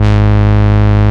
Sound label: Synth Shot; Vengeance Samples